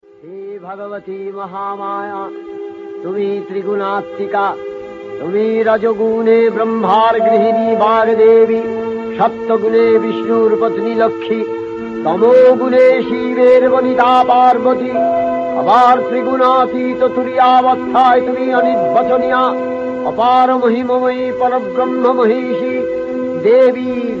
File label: Ma Durga Chandi Path Bhajan Ringtone; Mahalaya